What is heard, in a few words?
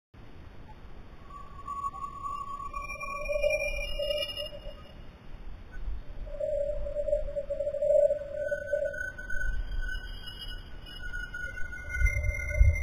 Signal